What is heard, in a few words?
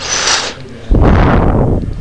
blow